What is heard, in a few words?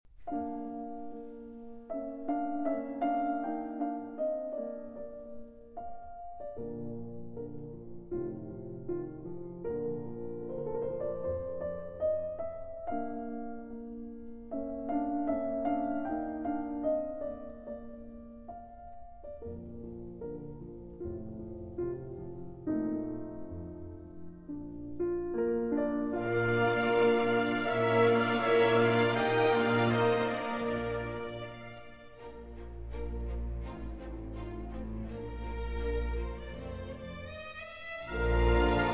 Piano Concerto in D Minor, Romance; Mozart; Piano Concerto No. 20